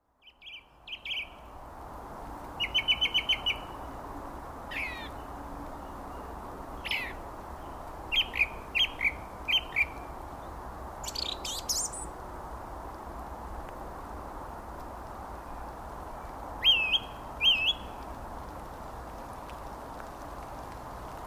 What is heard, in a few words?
Forest January to June Soundscape